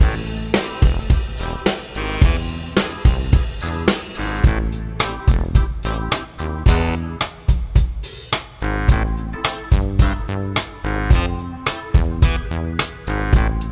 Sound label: funky